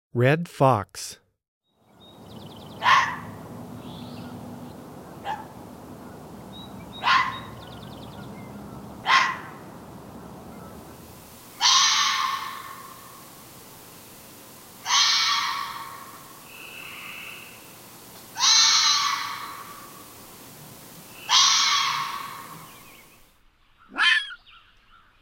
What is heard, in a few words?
Red Fox, Wildlife Sounds